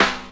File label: sparkle snare sample c key